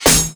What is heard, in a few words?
Spear Metal, Damage